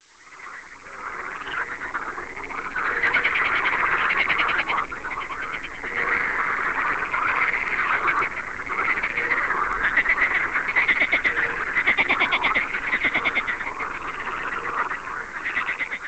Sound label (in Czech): skokan zeleny; obojzivelnici